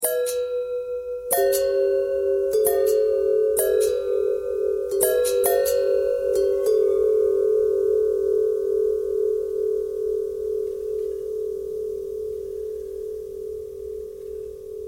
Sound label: long bell long bell thread pentatonic, bells